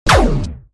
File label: TV Turn Off Effect Sound